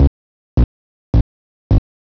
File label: bgm; svencoop; votemap